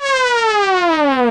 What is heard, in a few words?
chomp falling; N64; incoming; YOSHISISLAND